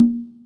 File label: TR kit, techno kit; Pro-Rec - Synthsonic